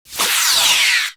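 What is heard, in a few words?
phaser blast; Star Trek Deep Space Nine - Entertainment Utility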